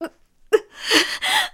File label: Cry; Hilda crying at Ravio; zelda